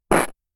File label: funny fart at party